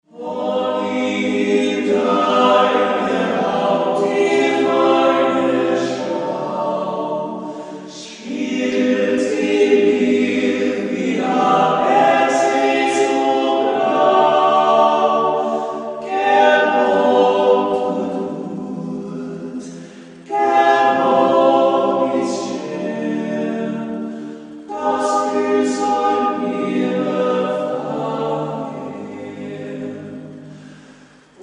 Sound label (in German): kaerntner lied; volksmusik